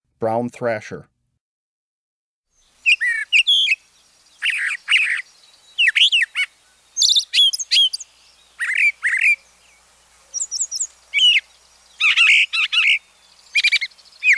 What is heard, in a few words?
Brown Thrasher; Breeding Birds of the Chicago Region